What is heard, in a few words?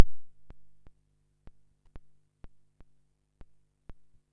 drums; drums 'r' us; MusicLine